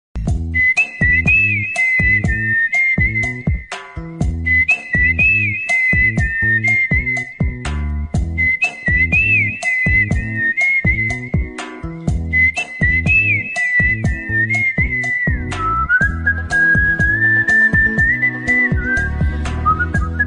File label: whistle bgm